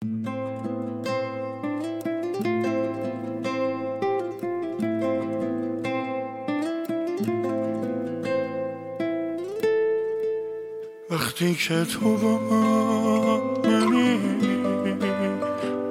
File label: Guitar